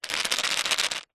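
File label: household vitamin tablets in plastic pot lid on shaking fast; zapsplat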